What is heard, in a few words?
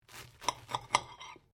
TOAST CUT; Various food preparation; Food (Sound Effects:Household)